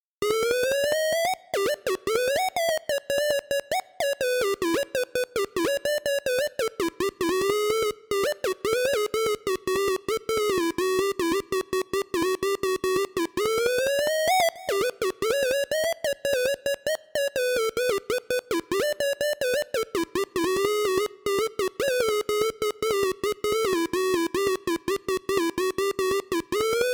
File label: Melody; chippy